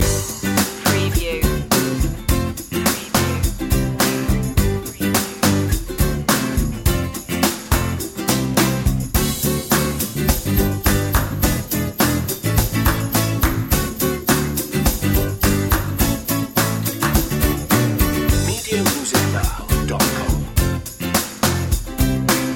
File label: easy and fun loop